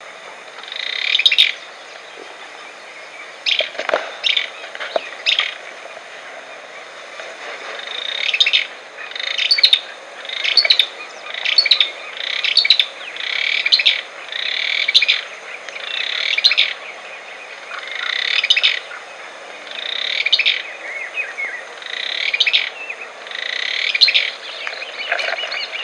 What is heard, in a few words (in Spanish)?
barullero voz; aves